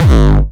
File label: 909 Grime G1, Kicks; Mbase 11 Kicks